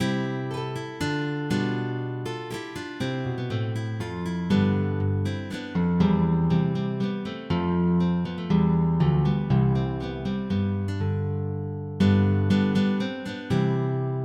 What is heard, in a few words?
Sarabande, MIDI